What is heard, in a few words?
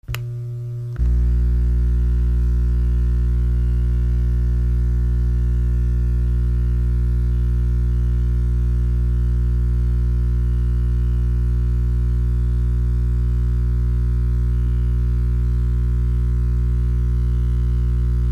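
Massager On Run No Move, buzz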